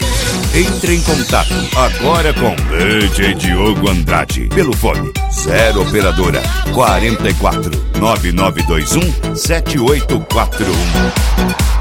eletro funk

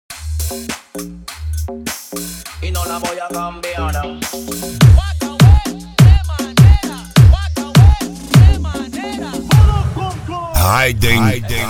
dale reggaeton

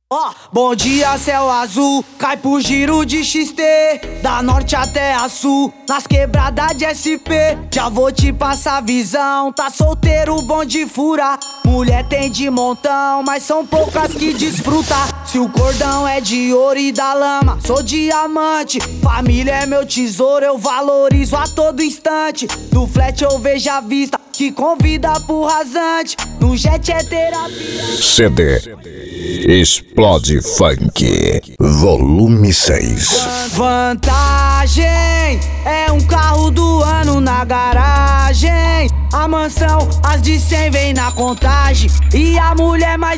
explode funk

